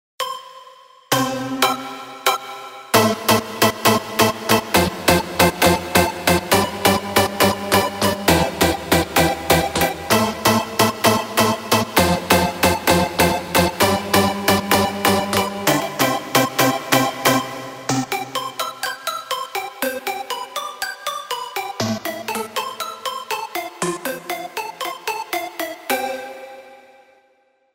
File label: Synth